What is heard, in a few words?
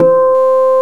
HARP; Greytsounds; HARP+STEAMER